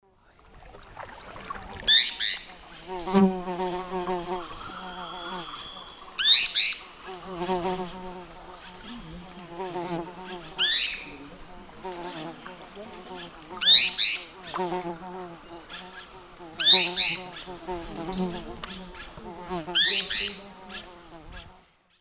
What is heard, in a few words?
Philohydor lictor, calls; S. America; Eastern Lowlands